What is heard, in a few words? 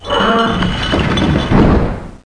port down; dactylsp